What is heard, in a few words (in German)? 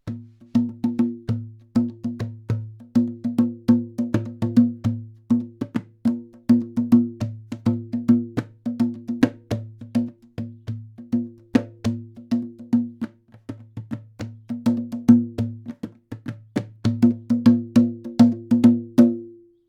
soundbeispiel konikdrum klein